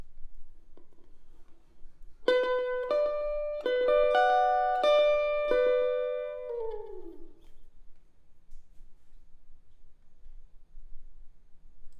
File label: Elect Guitar